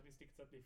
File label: Room A